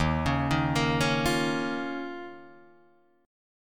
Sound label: D sharp 7th Suspended 2nd D sharp, Guitar, DADGAD